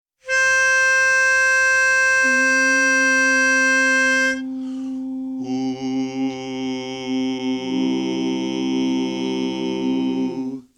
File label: take me out to the ballgame bass, A Capella